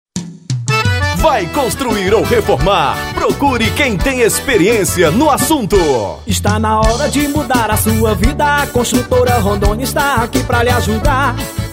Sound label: JINGLE; CONSTRUTORA RONDONIA